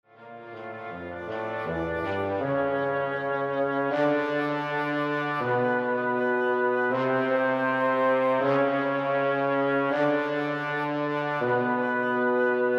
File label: Woodwind Quintet